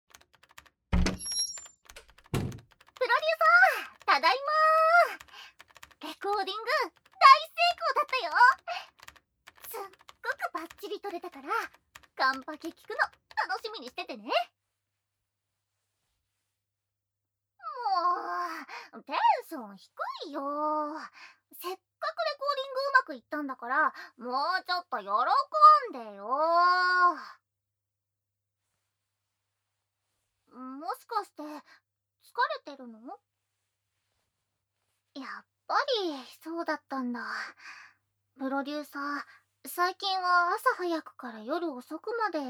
誘惑耳舐め手こき

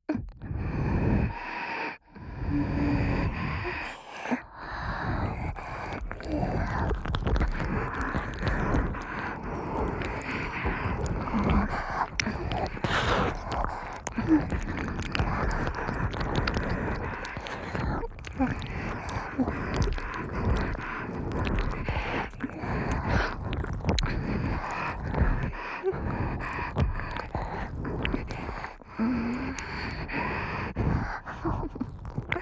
ダブル鼻息耳舐め

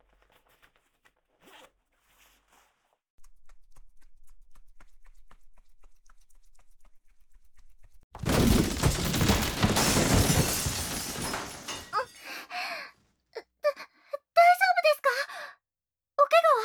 耳舐め・耳舐め手こき・お掃除フェラ・フェラ; 正常位(ディープキス); 魔法少女の搾精ご奉仕エッチ; 絶対負けない魔法少女のイチャラブご奉仕; KU100

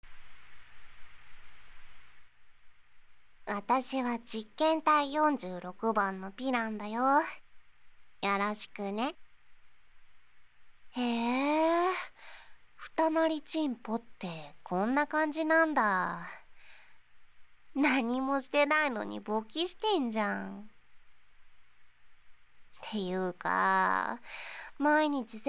ねぶりフェラチオ; ギャルのいじわるオナニー<射精させない、ねぶりフェラチオ>; アルコの悪夢・第2話

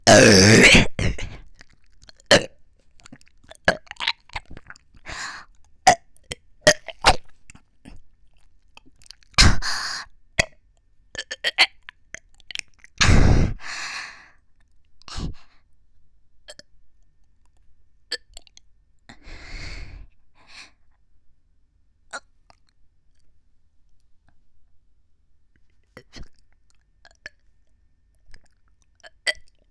ゲップ祭り ASMR ゲップのみ R; 実演; ハイレゾASMR

